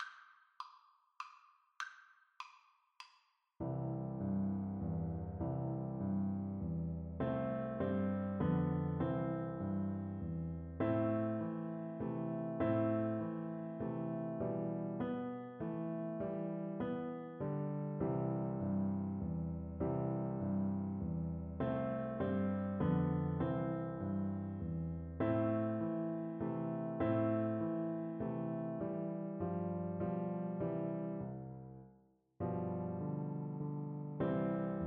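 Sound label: waltz trumpet karaoke; brahms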